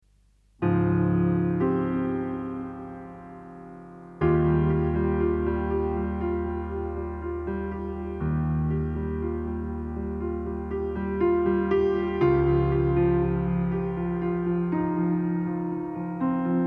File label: PIANO